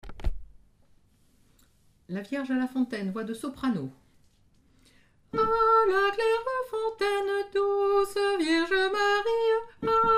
vierge a la fontaine soprano, chants noel

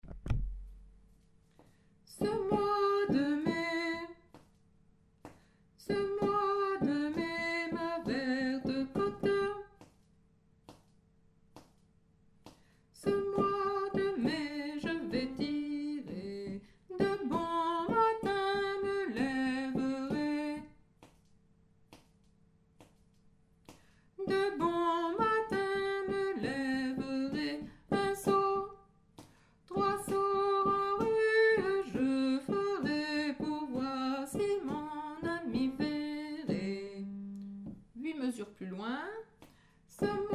Ce moy de mai Basse

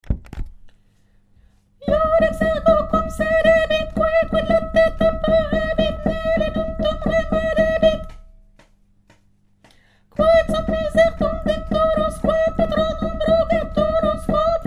dies irae lettre I soprano

